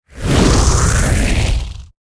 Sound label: Flame Breath FLAME; Evolve; Meteor Goliath